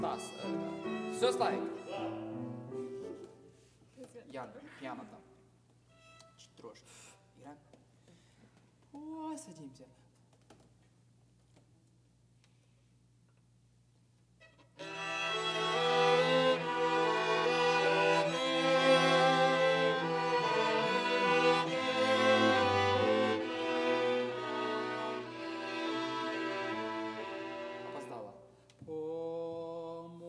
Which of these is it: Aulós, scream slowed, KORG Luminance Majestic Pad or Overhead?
Overhead